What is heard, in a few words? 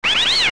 Die; Bari; zelda; OOT